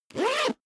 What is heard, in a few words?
zipper backpack close fast